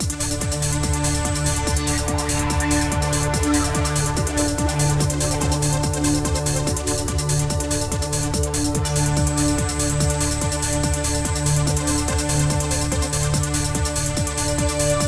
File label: Fans Trance Loop